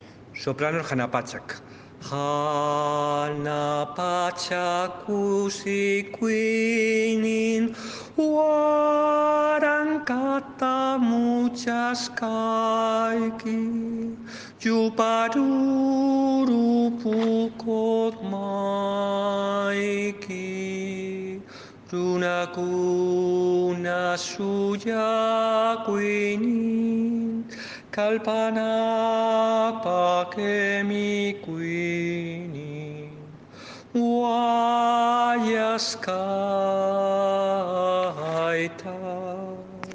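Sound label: soprano; Hanacpachap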